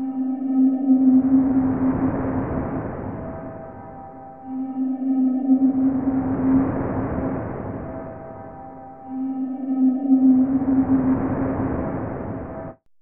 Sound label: MYSTERY, AMBIENT; AMG - Vince Clarke Lucky Bastard